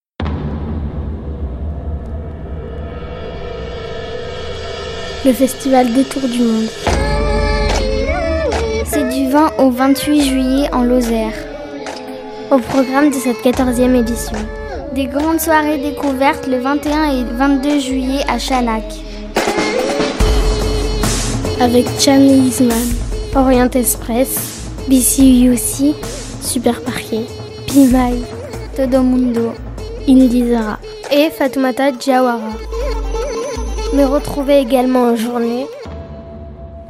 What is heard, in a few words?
JINGLE